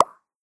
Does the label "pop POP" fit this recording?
Yes